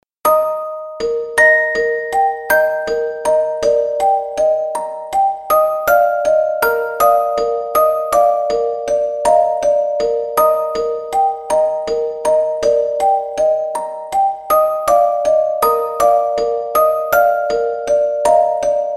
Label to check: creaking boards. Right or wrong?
Wrong